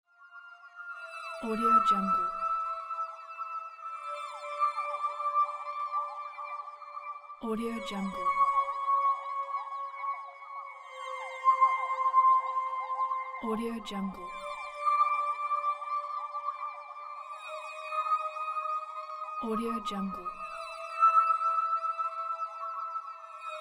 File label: cinematic space ambiance